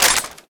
ammo pickup

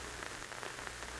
radio static; de jor1

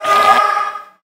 die; npc; rtrooper

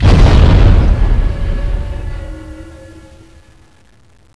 bomb explo; de smallcity

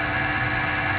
power; temple

